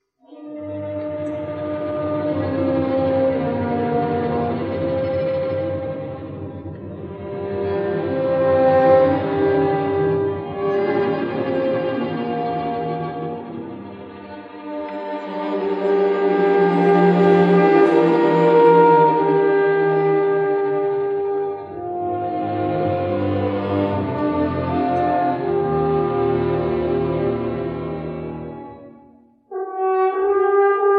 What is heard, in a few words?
Variations Horn